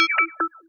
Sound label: grabbity hover ping; player